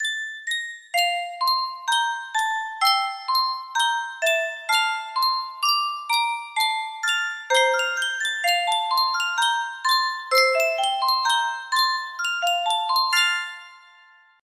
Yunsheng music box

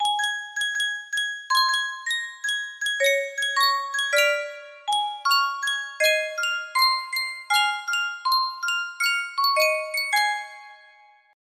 Sankyo Music Box, music box melody; frozen

